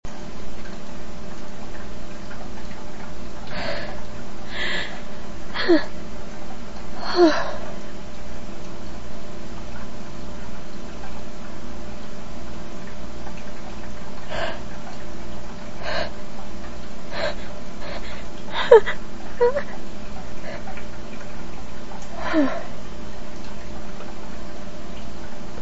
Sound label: build ups, female